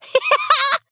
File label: laugh